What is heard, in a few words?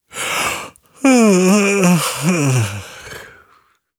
male yawn